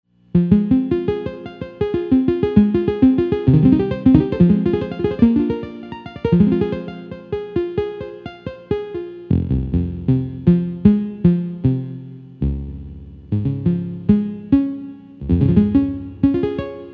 pro piano clavichord harp solo, Farfisa combo organ; Farfisa Professional